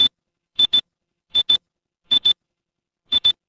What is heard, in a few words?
ui countdown